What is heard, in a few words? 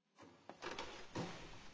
Shitting large pause Music